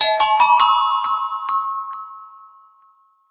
tinkle, notifications; Sony; Xperia Z3

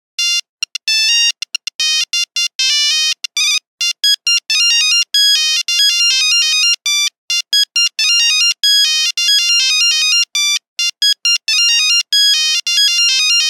Nokia 1100 Rote, Nokia Buzzer; Windows Phone apps

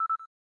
cam timer last

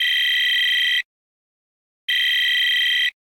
alarm, alarms; Freetel RAIJIN-FTJ162E

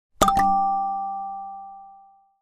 Calendar alarm, Nokia alert tones; Asha 301 Southeast Asia

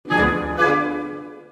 sonata, notifications; Sony Xperia T

